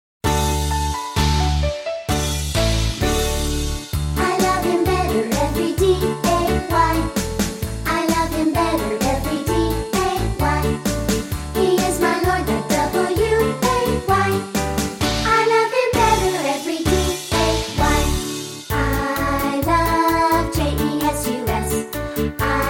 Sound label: Childrens Choir